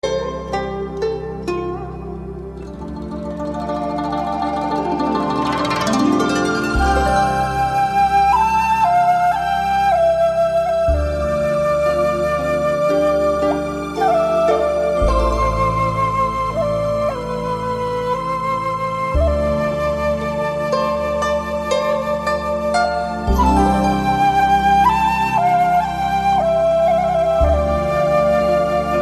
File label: japanese song, Japanese Instrumental